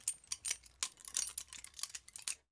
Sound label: Butterfly Knife Movement; Fairlight Sound Lib